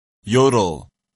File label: yodel